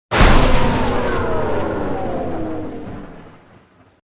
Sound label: power